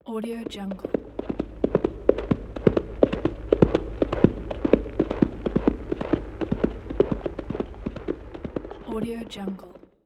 horse galloping on the snow

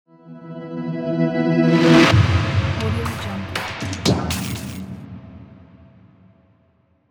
electronic sting logo

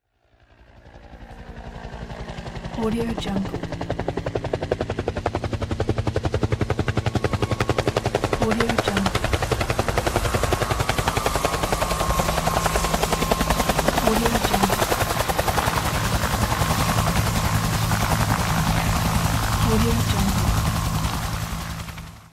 helicopter arrival; pure-noise

